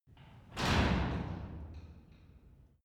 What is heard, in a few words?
garage door shut